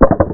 clunk

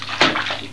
SPLASH

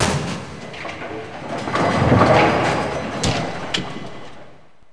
ARM, BOWLING

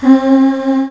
CHOIR; VOCAL

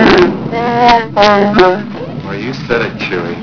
chew

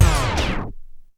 SLOWDOWN

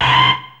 SCREECH

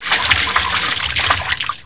SPLASH, WATER

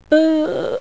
YAWNIE

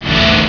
fired

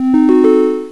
DOODLE

